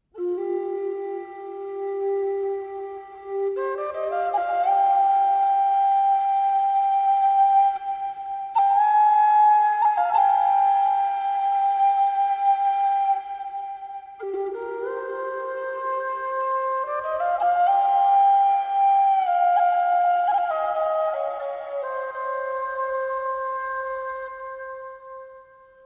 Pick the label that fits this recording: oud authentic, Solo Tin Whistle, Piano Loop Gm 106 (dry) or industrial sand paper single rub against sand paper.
Solo Tin Whistle